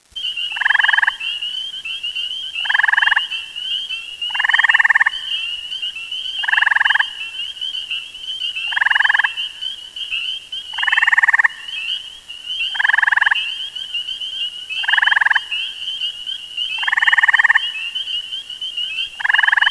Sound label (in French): rainette versicolore